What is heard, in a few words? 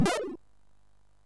sid MOS6581R3; Fuzzball level 2